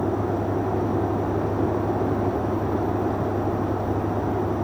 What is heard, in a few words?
Air Conditioning Internal Unit Fan Loop